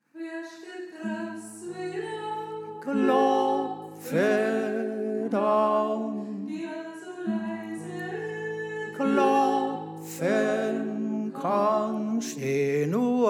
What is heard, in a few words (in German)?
Tenor